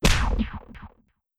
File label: UI Credibility Decrease; fungus; Sherlock